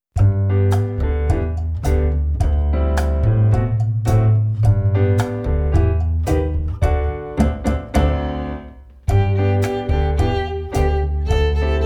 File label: Finger Tapping Cha Cha